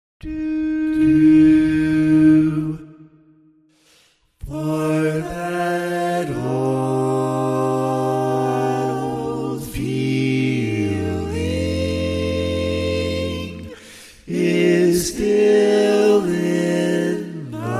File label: Bass, Barbershop Tags